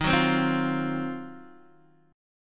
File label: Eb Minor 6th Add 9th, Guitar Open D